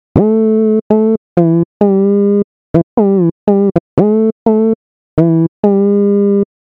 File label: whistle treble dist